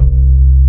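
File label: harp harmonic C2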